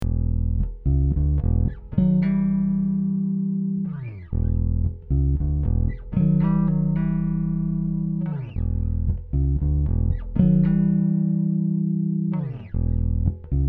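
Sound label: Tele BiSonic, four string fretless semi hollow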